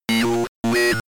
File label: 8 Bit You Win